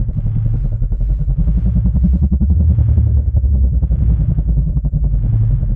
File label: think tank core amb; Stellaris; utopia